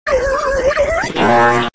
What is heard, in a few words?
brain fart high pitch